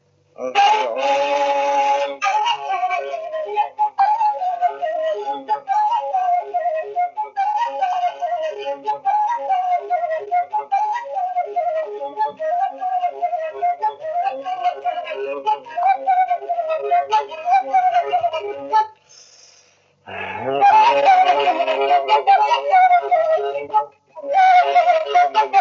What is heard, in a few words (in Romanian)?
Batuta fluier; Vaslui